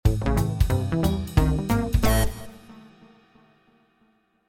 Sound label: Bonus Round; Death by AI